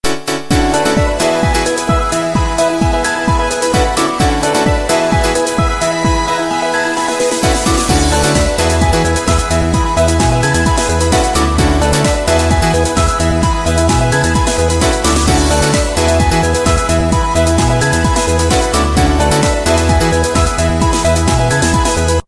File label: Signal Wave